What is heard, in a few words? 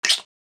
bug; creature